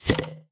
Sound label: SFX Show Notification